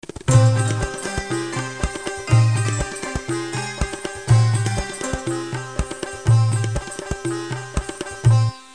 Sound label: belly dance